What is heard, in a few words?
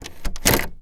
Door Knob Open; zps; Ambient